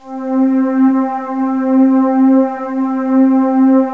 warm pad, synth pad; gmplay

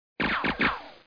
explo; PC Gamer IT CD 15; bgw demo

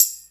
TAMBORIN; Groove

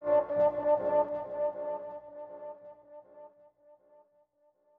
HORN; LiveAct Pool; AcidJazz Funk

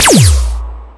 squad lasers; darwinia demo

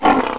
roar; Monster Media Number 15

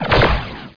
PLASMA

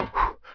ladder; PC Gamer IT CD 41; Daikatana

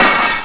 dish crash, cartoon sfx; Sound Effects for Multimedia - Volume 1

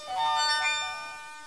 harp; Art Passion

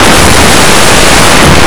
drawer; Blue Heat

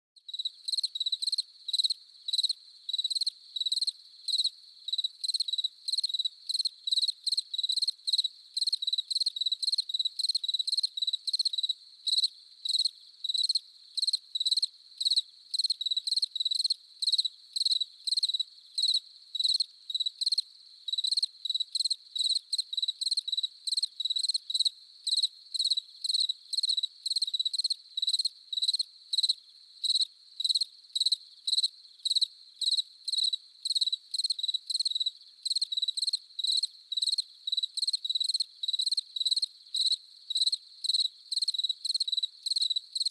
crickets; anito; ambient

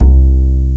Acoustic Bass C2; Hexen Demo; Hexen Music; Hexen 6vox

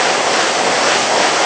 skid snow; PC Gamer 49

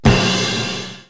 Small Gong; KABOOM! - Wild and Wacky Sound Software; Beep Sounds; Bings & Boings

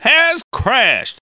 HAS CRSH

